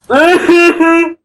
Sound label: ahaha